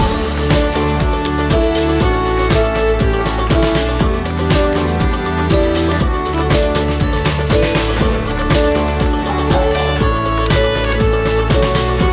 ambience free spin; wolfcub

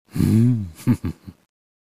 santa Welcome; jingle spin

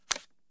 card shoe, baccarat; baccarat2

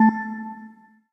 paytable Button Click; wild water

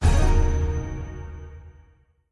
sticky Win; longpao

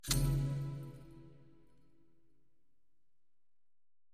spin Button Quick Stop; fairyhansel

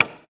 slider increase click; jingle spin

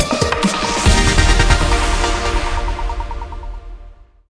large win; jewel fruits

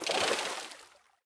spin; strolling staxx

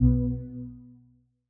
quick Stop; east sea dragon king

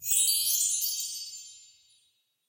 generic chimes; irish pot luck

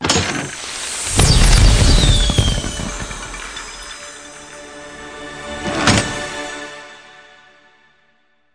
pick And Click FS; fairyhansel

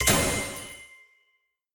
vine respin add; jumanji

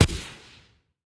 win Symbol Flash Add On; fairyhansel

